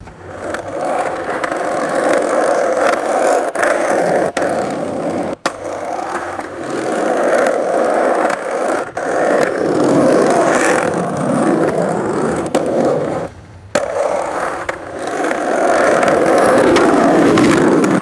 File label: skateboard rolling and pushing on pavement